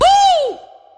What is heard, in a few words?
Moombah Vocal; Moombah Kit; Hip Hop; STYLE KITS (Hip Hop, Etc)